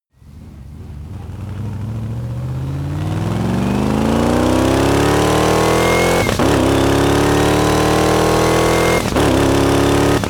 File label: car mitsubishi pajero v8 fast acceleration long deceleration